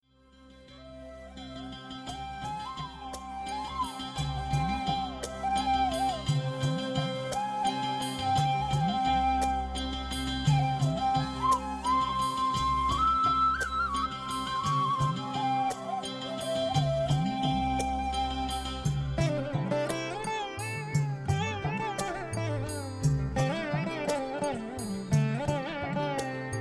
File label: flute mandolin soundtrack